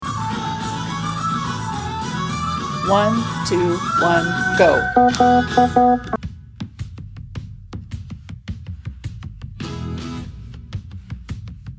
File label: heave away lower performance; keyano theatre; auditions